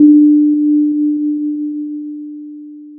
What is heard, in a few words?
pop, Sound of a church bell